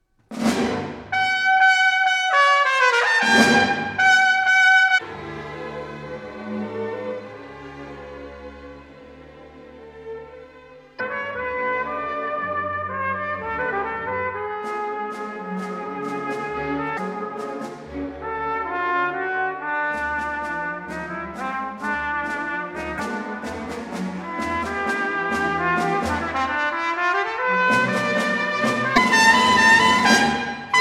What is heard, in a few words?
Concertino for Trumpet String Orchestra Tambour, Allegro